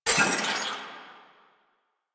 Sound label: Stomp Shockwave End; Warframe; Caliban